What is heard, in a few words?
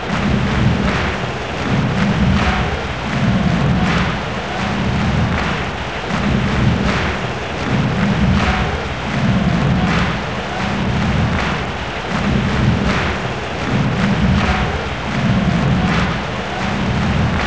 chant; Strikers